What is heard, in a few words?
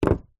household trophey set down wood table